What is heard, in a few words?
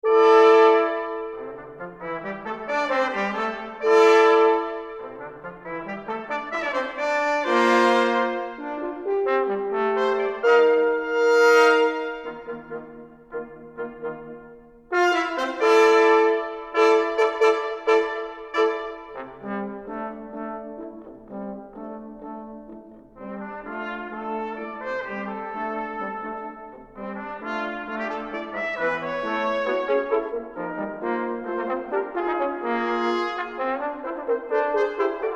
Brass Trio